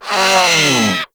Kavat Vocal Death; Warframe; Warframes; Khora

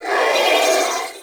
Sentient Symbilyst Pain, Barks, Enemies; Warframe

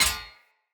Short Sword Impact Parry Sword; Weapon Impacts; Enemy; Swords; Sinecure Short Sword

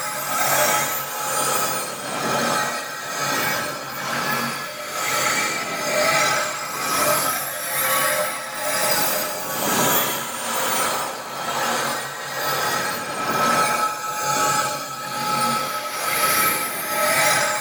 Conduit Loop; Warframe; Entrati; Disruption

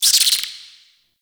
Interior Spider Chatter; Ambience; Warframe; Duviri; Murmur

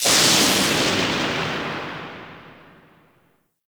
Electricity Blast; Ambience; Props; Warframe; Nineteen Ninety Nine

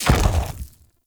Wave Orb Ground Impact

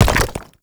Mech Step Surface Rock; Vehicles; Step Surfaces; Warframe; Nechro Mech

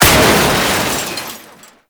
Car Metal Smash; Ambience; Gameplay; Warframe; Nineteen Ninety Nine

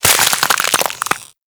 Ice Freeze Hit; Warframe

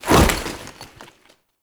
Foley Melee Sweep; Warframe; Characters; Kahl

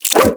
Nunchuck Start Whoosh; Weapons; Warframe; Infested

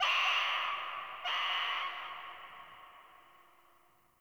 Birds High, Ambience Plains Landscape; Warframe; Eidolon Plains

